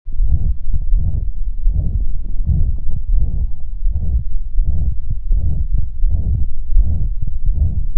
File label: ruptured chordae